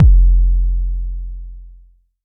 phonk kicks oneshot - 808 f, Specially designed for phonk type beats, these nasty, layered 808 one; kick drum